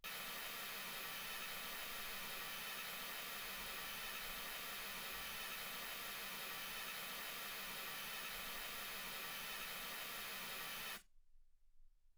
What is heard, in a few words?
mls16 48kHz